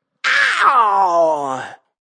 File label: Vocal Funk Yell